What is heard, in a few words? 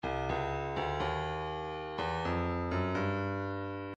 breakbeat midi, 123 bpm, kik; Festivo; Stylus Breakbeat Midi Files